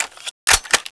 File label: sg550 clipin, weapons; cstrike